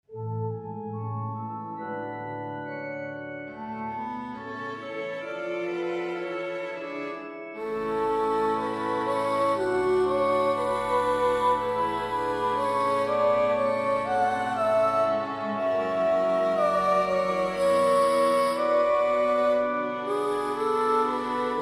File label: Ave Maria Soprano Trio String and organ; Saint-Saens; Paolo Pandolfo